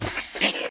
Damage; Mob

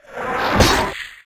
war attack; Gramble

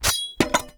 Throw Skin, Damage

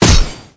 Sword Metal, Damage

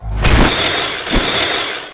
war attack; NECROMETA; Mob

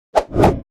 High elf Warlock attack

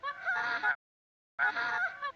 Peace idle; Mob

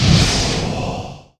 fire piece

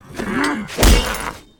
WAR ATTACK; HUMBABA; Mob

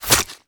Sword Scale, Damage

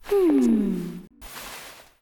High elf Warlock peace idle

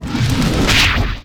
Attack; Eldcrochi; Mob

